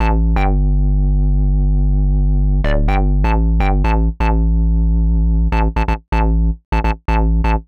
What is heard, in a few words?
Hard Synth Bass A, 125; PowerFX - Nu Jazz House